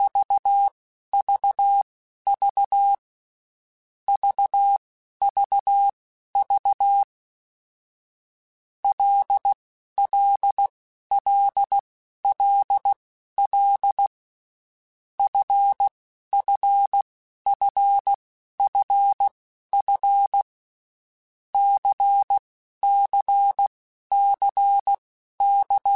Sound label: LFCYQ, Morse Code; CW Code Groups; Basic Letter Groups; HamRadio; Basic Sets